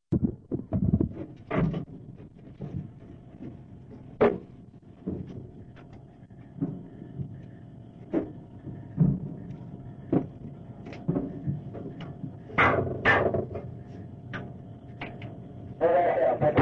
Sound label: initial shock, Rockets Weapons Explosions